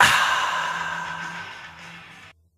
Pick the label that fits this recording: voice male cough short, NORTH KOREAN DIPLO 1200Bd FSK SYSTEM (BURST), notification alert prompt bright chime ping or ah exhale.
ah exhale